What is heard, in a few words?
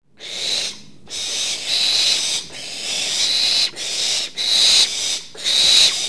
chicks snoring